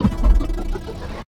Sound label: Punch Right Slow Down; superhard; Pitch Black - A Detective's Demise